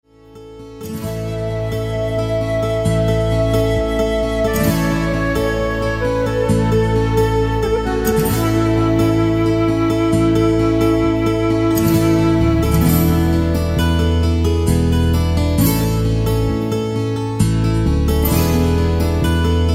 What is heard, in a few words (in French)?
instrumental